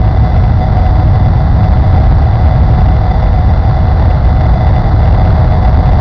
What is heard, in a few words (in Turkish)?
uçak motor; tasitlar